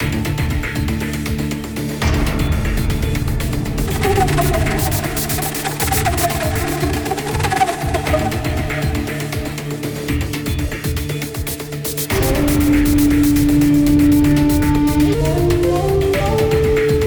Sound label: Backend Music; Storm Racing G